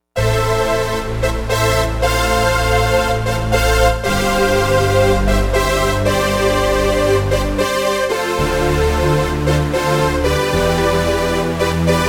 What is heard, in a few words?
Saw Crusher; Digital Synthesizer